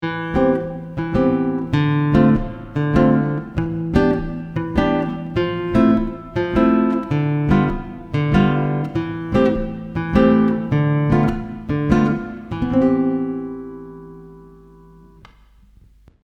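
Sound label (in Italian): Esercizio, Chitarra sola, pratichiamo con l'accompagnamento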